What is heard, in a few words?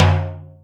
Rim T4, Boss DR660, lt; drum machine